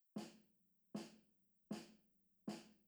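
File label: bass drum, drums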